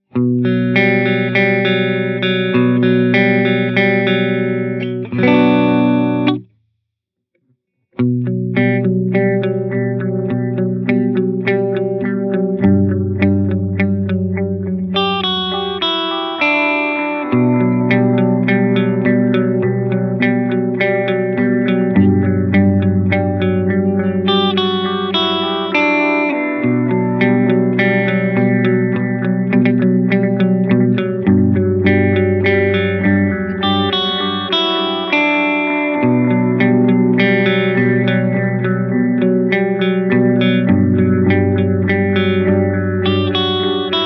Analog BBD

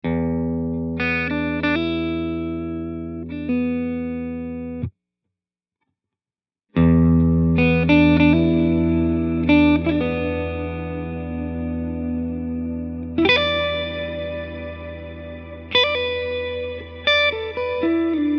Room Long, reverb

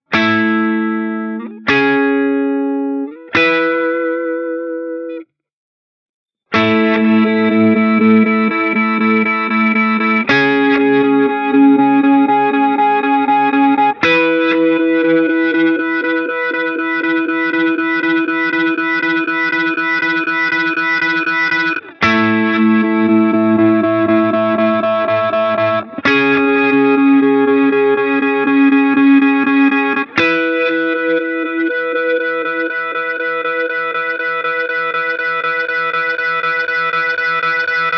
Reverse Triggered Reverse

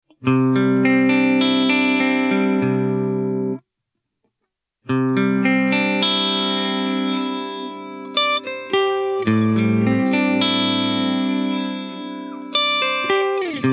Reverse Stop Arpeggios

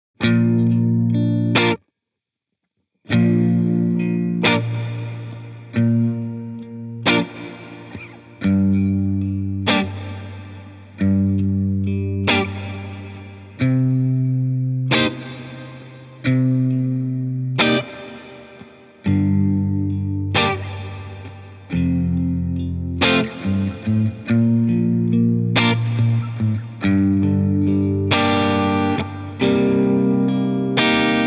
Delay Reverb Hall

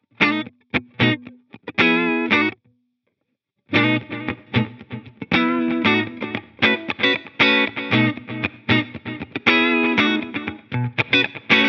Delay Reverb Plate

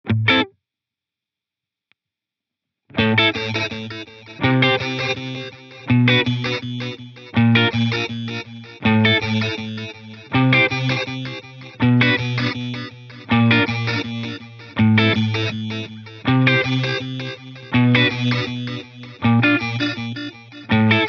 Lofi Digital; echosystem

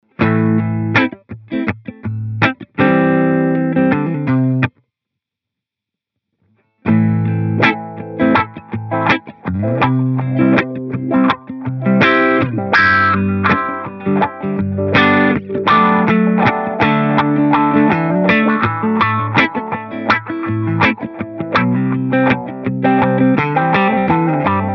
Filter Warp

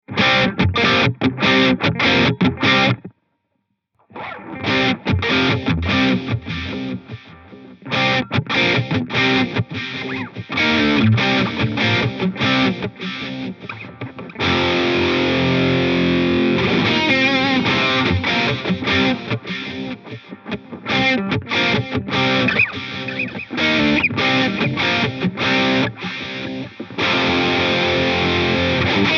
Multi Tone Taps

